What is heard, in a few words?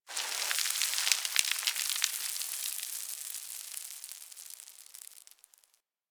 Landscape Dust Scatter, Ambience; Warframe; Venus Open World; One Offs